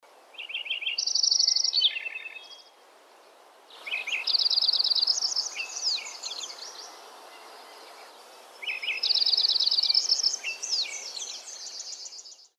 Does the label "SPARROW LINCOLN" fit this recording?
Yes